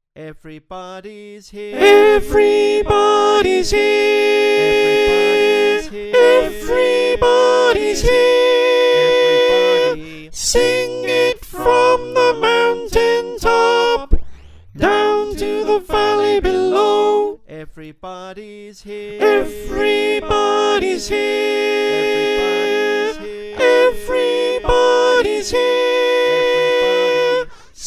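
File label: Soprano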